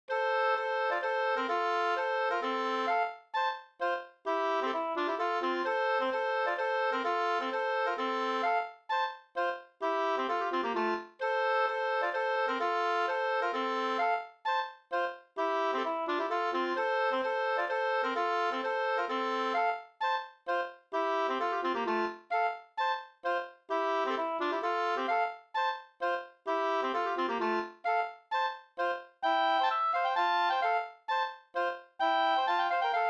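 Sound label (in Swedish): polska, tvåstämmig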